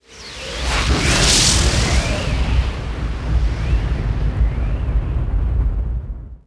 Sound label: explo spaceball; impacts explos